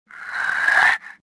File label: gasp; Star Wars Jedi Knight II Jedi Outcast; XBOX; shadow